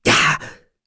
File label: Damage, Vox; Baudouin; kingsraid; voices heroes